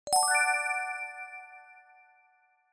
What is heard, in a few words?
correct Sparkles; SpaceQuestions MarioKart